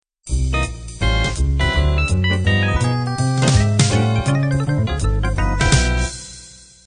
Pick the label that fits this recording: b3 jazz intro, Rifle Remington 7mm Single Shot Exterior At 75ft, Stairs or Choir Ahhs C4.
b3 jazz intro